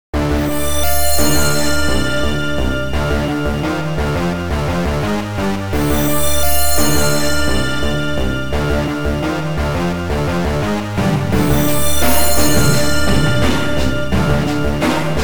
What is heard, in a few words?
Theme (Sound Blaster Version); Zone 66